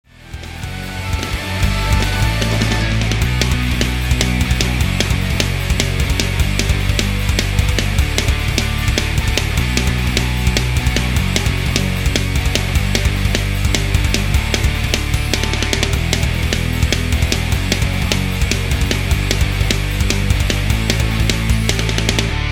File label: backing, guitar